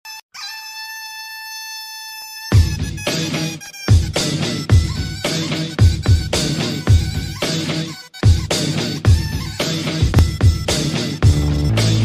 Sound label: Bgm; Arjun Reddy